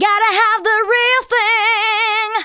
VOCALS SUNG; Techno Trance Essentials

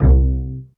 PIZZ CELLO C1 L; Greytsounds VOL-1; STEREO PIZZ2

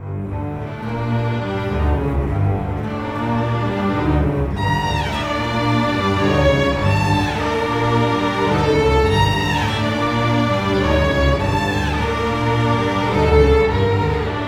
Cinematic Strings, Orchestral; Sonic Foundry (Sony Creative Software); Orchestral Series VOL-3; Cinematic 11

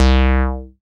SYN TB SUB, TB-303; Sound & Vision - Gigapack I CD 2

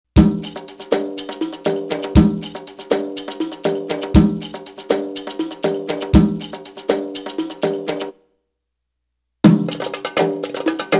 Arabic Rhythms; Hadeeth - Arabic Rhythms Sample CD

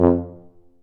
C TUBA, stacc; Roland L-CDX-03 Disk 2; BRS Tuba

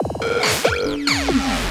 Loops Fills; Vengeance - Essential Clubsounds Vol.2

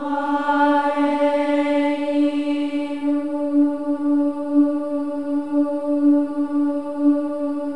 AH EH; Spectrasonics; Symphony of Voices; AH-EH-EE

